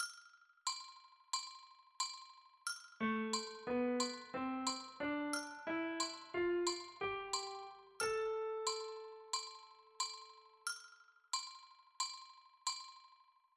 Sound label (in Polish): Mamy dwa takty grania synkopy i na końcu trafiony raz - rozwiązanie, Z podkładem